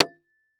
Impact Milk Carton Plastic Hit By Hollow Metal Pipe